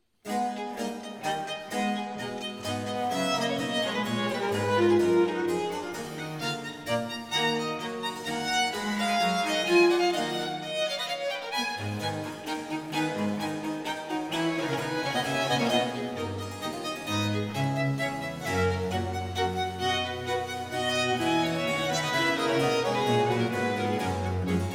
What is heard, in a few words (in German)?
Sonata A Moll Allegro